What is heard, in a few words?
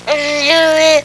BABY TALK; HAUS